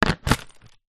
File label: leisure lego box lid on full; zapsplat